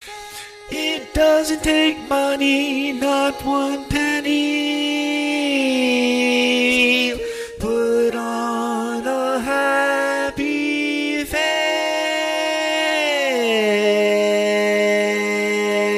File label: Bass, barbershop tags